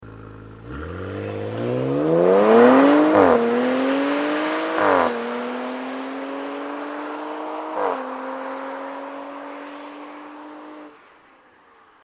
RS4 vor OPF Losfahren, Abgasanlage Audi RS4 B9

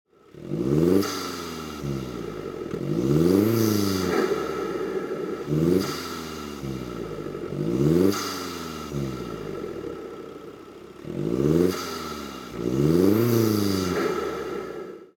Stand mit Intake, Open PEX Air Intake 2.0 TDI; Ansaugung

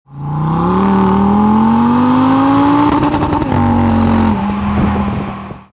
AGA Fahrt Begrenzer, Abgasanlage Audi RS 3 Sportback 8V; stoll sport; Fahrt 03 mit Begrenzer

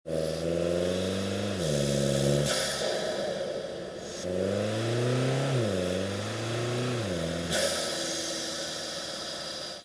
Fahrt mit Intake, open pex air intake 2.0 tdi; Ansaugung

